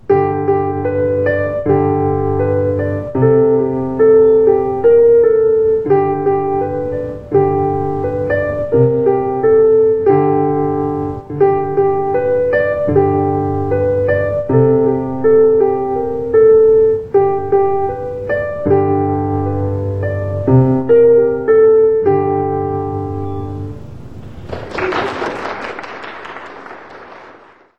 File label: rockin tune